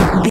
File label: retro impact hit